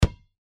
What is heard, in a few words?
ball bounce single